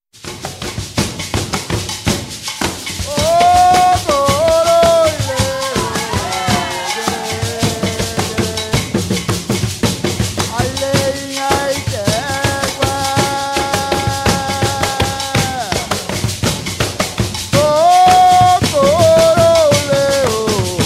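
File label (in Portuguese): toada para iansa, terreiro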